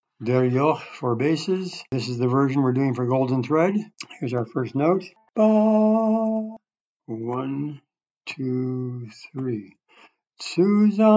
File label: bass, Yiddish Community Chorus; all the way through; Der Yokh